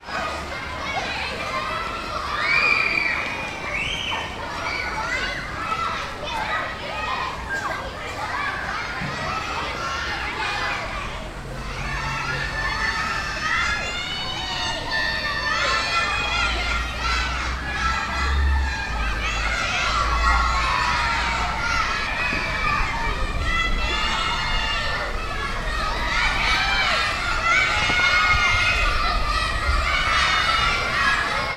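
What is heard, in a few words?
ambience public place outside school playground children play car short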